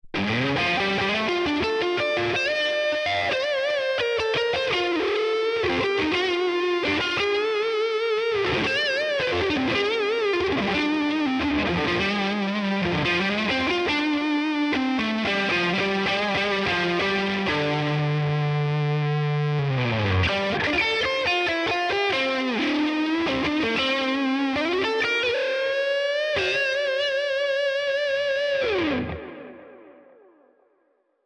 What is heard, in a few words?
Tone King Imperial MKII Squishy Lead